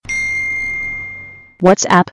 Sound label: Notification; Whatsapp